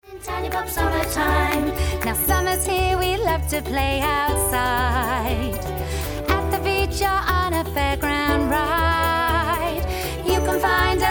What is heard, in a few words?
singing